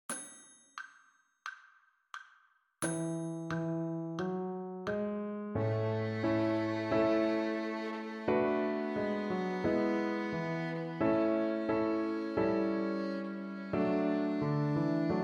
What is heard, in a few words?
A major 2 oct scale